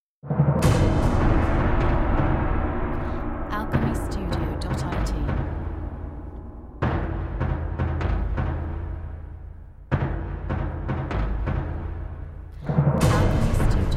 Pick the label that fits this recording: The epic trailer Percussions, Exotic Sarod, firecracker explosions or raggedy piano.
The epic trailer Percussions